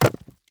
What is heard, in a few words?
object drop stone